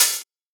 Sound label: Open Hat; Ghosthack Advent Calendar 2017 Day 5